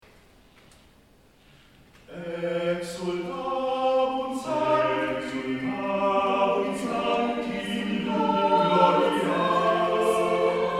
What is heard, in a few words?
Exsultabunt justi Ensemble